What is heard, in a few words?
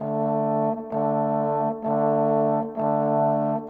Horns, Orchestral; Sonic Foundry (Sony Creative Software) - Orchestral VOL-2; Modern 38